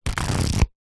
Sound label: household book pages flick fast; zapsplat